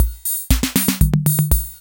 FILL, KR55; INIS - Funky Xtreams; KR55 LP4

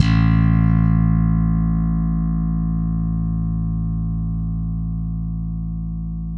SLAP A 2, BASS; Spectrasonics; Bass Legends CD 1

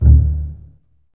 BSS D 1 Pizz, String Ensembles Basses; Miroslav Vitous

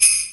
Slay Bells, Drum Machines; EMU Planet-Phatt

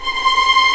C6 TREM, VLNS TREMOLO, String; East West; The Ultimate String Collection

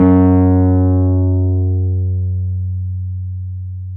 PNO, Wurly

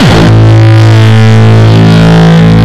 Longkick, kicks

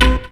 HIT, STABS, House

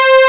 BIGBASS

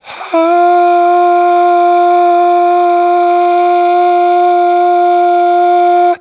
AHHH, Vocal; Polyend

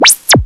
Filter FX, Fairlight CMI III

